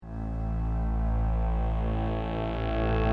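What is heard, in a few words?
piano reverse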